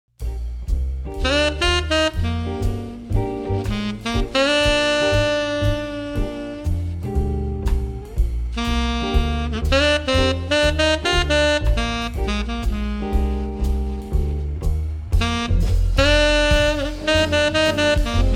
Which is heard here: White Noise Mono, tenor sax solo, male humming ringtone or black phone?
tenor sax solo